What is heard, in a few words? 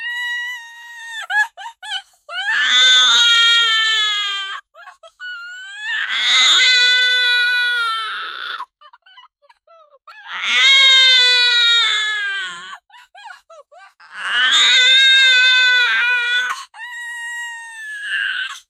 crying witch